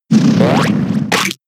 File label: Withdraw; pokemon sfx gen 7 attack moves sumo usum